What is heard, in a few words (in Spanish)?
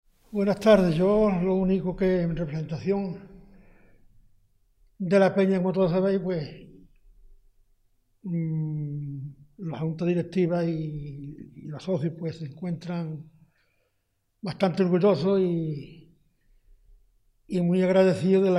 cantaor flamenco; FESTIVAL FLAMENCO